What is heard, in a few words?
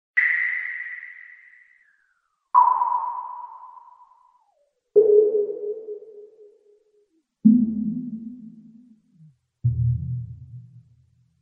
Weird Nice Sonar